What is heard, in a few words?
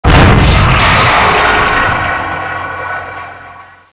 core overload; ambience